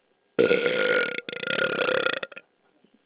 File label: belch